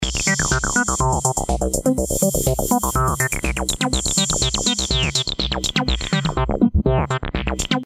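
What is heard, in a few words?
606 303 filter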